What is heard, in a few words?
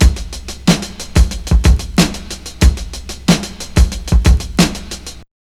DRMSND, Underground Hip Hop, BEATKIT; USB Soundscan vol.02; AKAI